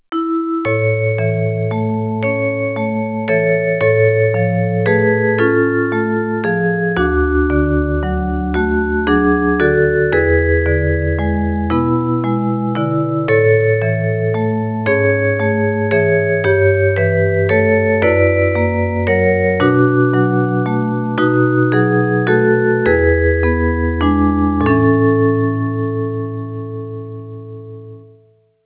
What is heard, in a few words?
bell; concert